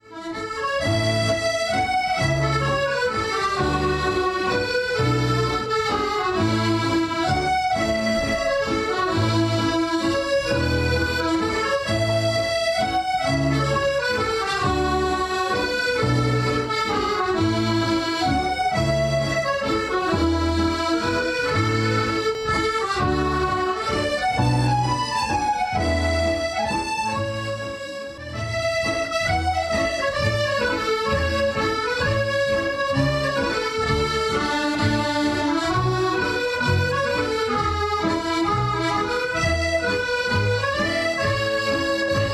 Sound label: accordion class; biggar